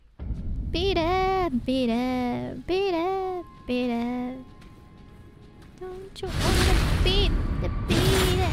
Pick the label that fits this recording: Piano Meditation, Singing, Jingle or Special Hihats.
Singing